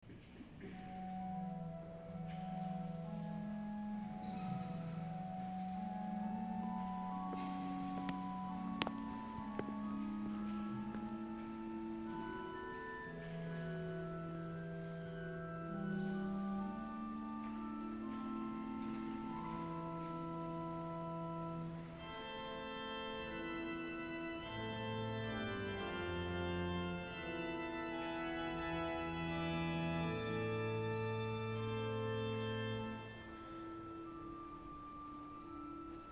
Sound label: Organ interlude